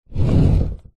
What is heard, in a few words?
household cupboard door wooden slide short low frequency; zapsplat